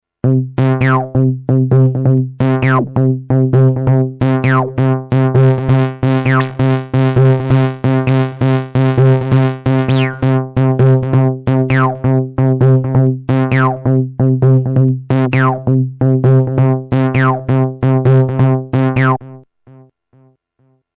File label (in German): SID mit Parameter Lock